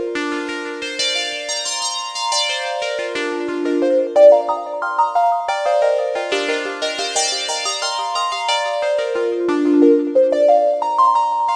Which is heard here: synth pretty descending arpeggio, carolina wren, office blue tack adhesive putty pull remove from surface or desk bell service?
synth pretty descending arpeggio